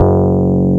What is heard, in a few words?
PUNCHREEDS; Wizoo - Powered DX